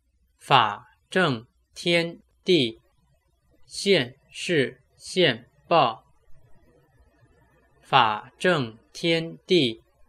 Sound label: mantra